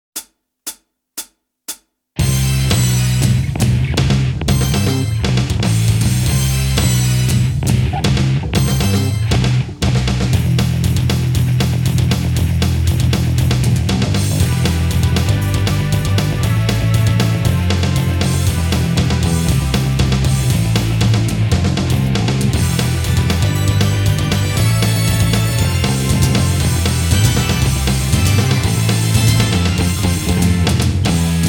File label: Guitar Minus Track